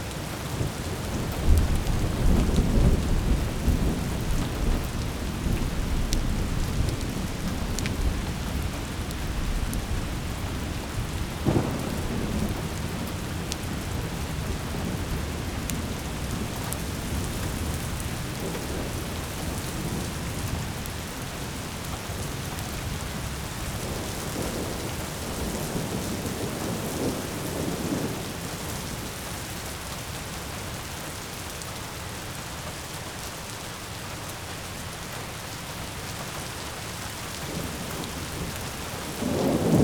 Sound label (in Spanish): Sonidos de la Naturaleza Lluvia y Truenos